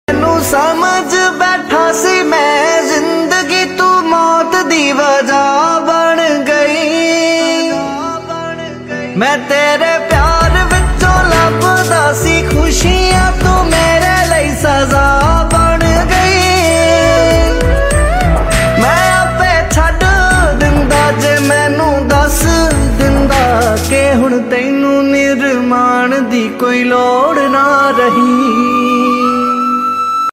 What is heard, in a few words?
Punjabi Song Ringtone